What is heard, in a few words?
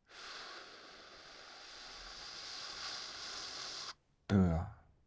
Schhhhhhhtör